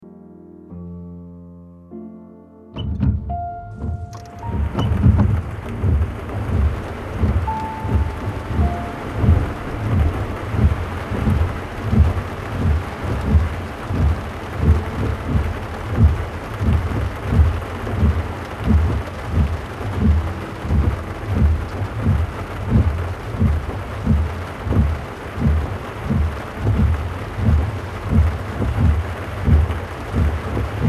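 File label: Rain ambience car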